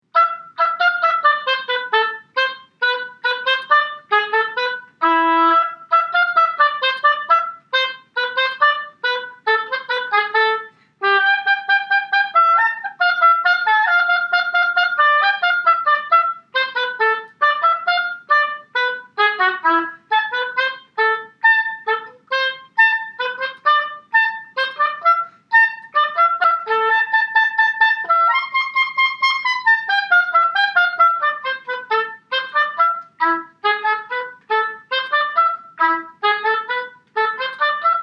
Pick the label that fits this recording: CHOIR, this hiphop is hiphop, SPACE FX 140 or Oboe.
Oboe